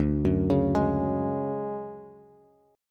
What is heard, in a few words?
D-Major Sharp 11th, D, Bass, Bass Standard; arpeggiated; JGuitar